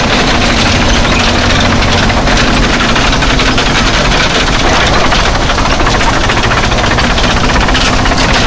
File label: tracks heavy speed, tanks; War Thunder